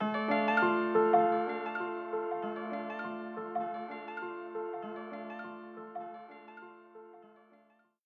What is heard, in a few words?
PIANO LOFI WOBBLE